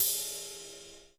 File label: ROOM CY RD, ROOM KITS; Crypton - DrumBase5000